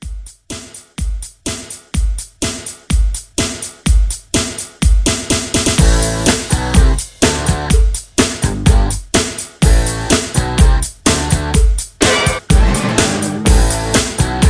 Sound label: 80s Rock Re Drum 125